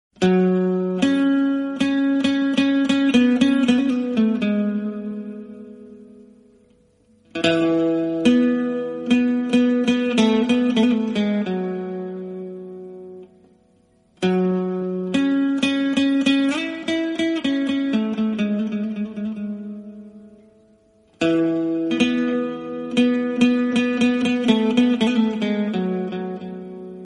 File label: guitar